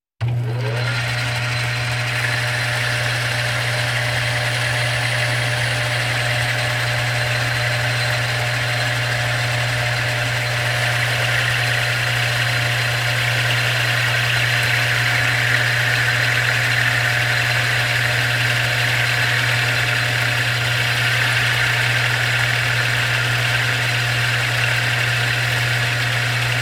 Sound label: Walking Machinery Trucks